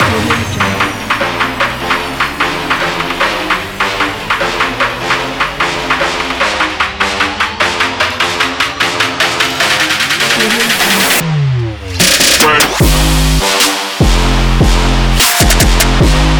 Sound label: on rap